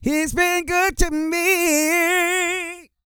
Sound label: GOSPEL